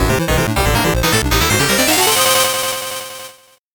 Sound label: 8bit jingle fast